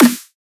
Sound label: Dubstep Snare; Ghosthack; Dubstep Sound Arsenal